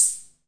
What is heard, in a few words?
wersi hh, Wersimat 24; drum machines